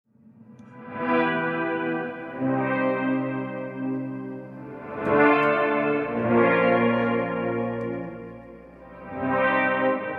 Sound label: reverse; ventris